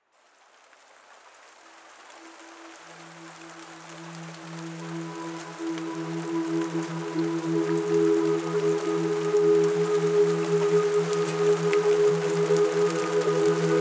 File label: Sounds of Rain